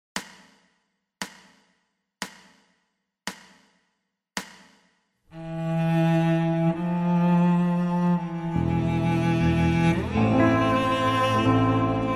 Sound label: Sad cello piano play